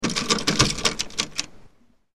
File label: Door Knob